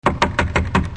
Door Knock

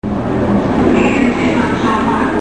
Hospital Sounds, Crowds

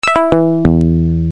elec bass; Music

